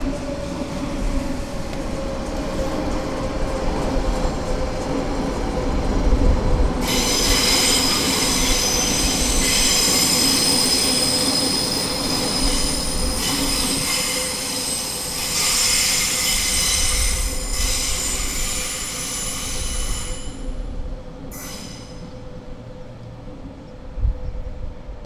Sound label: Morning Metro Squealing